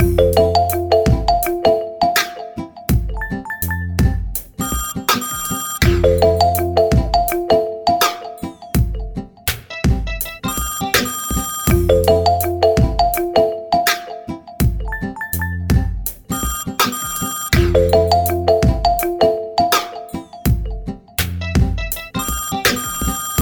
Calling; ZTE; Axon-20-5G

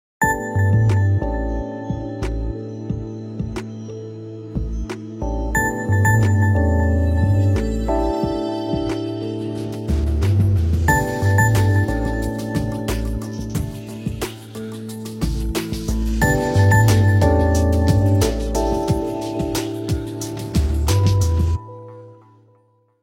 Clock alert, Nokia 5530 XpressMusic

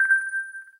lens flare lock; Samsung Galaxy S4 Mini Black Edition

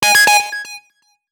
Spike alert; Sony Ericsson; PlusFourSix; SE Sound Project; Lars Edvall

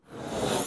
charging started

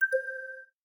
light lock

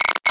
Charging Reverse; Nothing Phone (1)

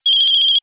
Bell; Audiovox; CDM-8940

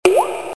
key; Huawei C5610

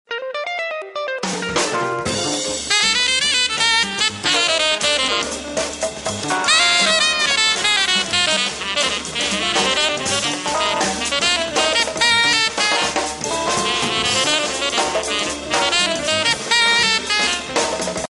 Jazz Bar

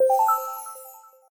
particle unlock; LG G Vista VS880

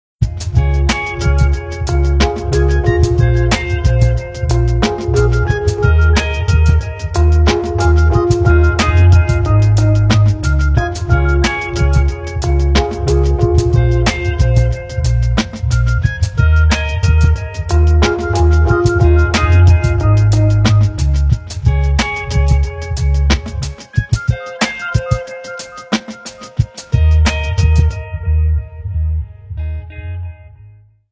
Nokia 6500 slide Ringtones; Sunsilk

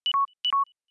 phonetones; Samsung SC-01B

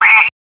Shutter

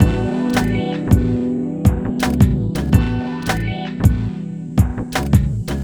Rnb; Google; NewWave Labs

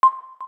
KEY XYLOPHONE 9, keytone

